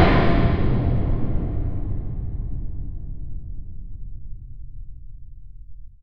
ambient; Sonic Foundry (Sony Creative Software); Ugly Remnants VOL-2; Beatstuff

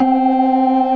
organ synth; InVision Interactive; Keith Emerson; The Most Dangerous Synth and Organ

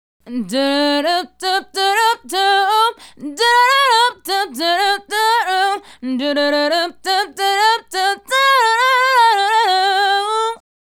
Female Solo, Hip-Hop and RnB Vocals

